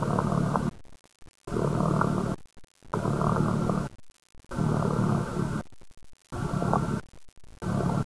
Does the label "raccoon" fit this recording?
Yes